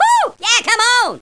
whoo

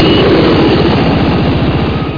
helepass; desertapache

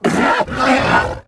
troll

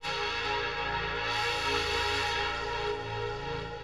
SYNTHPAD HOUSE 125 A; eJay; Sphere

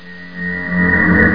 teleport; Quake; PainKeep

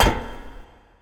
foot thick metal; Earth and Beyond; EA Games

